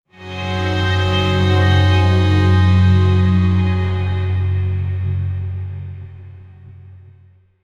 low strings swell, bollywood; sound effect; low strings swell duryodha

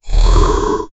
loud and twisted plant dr

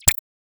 jrpg game slime bumping, monsters

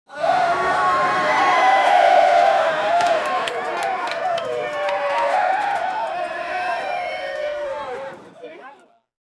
pirates crowd wince

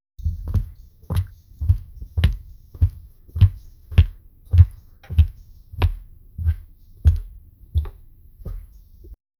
a domestic cat; domestic animals

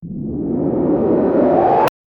fantasy open world level, level up

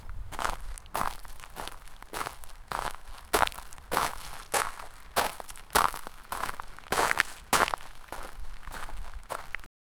footsteps on gravel

